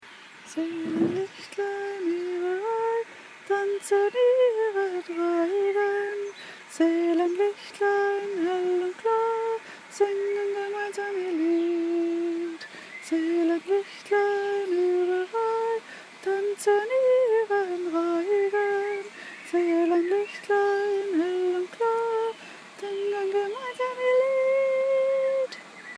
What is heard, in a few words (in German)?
feuer lebens lied ueberstimme